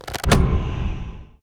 Attack Shadow Arrow; Game Essentials

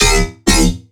Riddim Bass Hit D; One Shots; Gears; Ghosthack; Riddim Essentials 2020

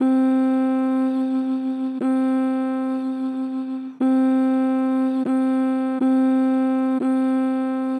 "Mmm", Harmor Vocals, Pitch Flat Strong

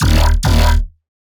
Dirty Bass D# 140, Bass Hits; One-Shots; River; Ghosthack - Color Bass Essentials

